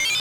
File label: Boot; Techno Drive; Namco System 12